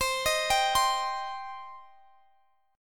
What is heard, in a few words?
C Minor C down Guitar DADGAD